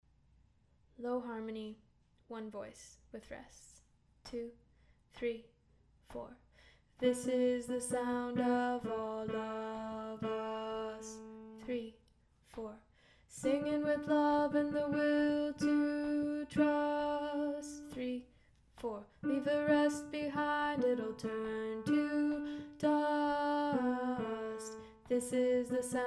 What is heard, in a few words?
one voice - low harmony